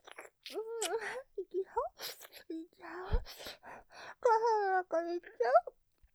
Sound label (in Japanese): 舐め, ASMR; 射精前; いきそ?ｗ言っちゃいそ?; ループで楽しむ！シェフの気まぐれ抜き抜きコース; モノラル